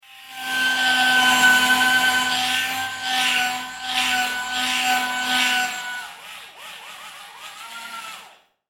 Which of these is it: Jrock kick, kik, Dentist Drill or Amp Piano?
Dentist Drill